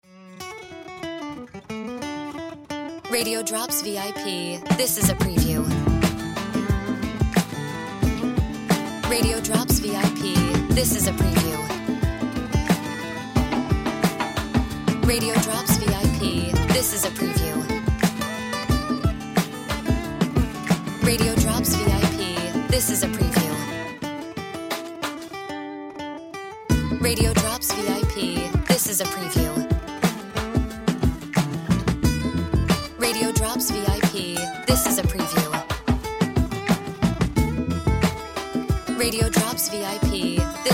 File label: Music Beds